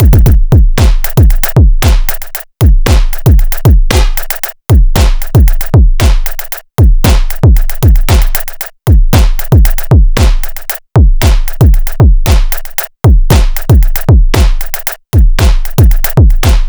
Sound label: Drums, Rock